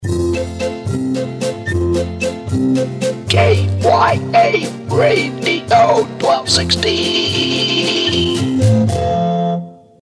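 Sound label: Big Band Logo - Instrumental, jingle; KYA; (Instrumental redo of Signature)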